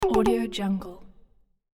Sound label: medium stab triple button; cinematic designs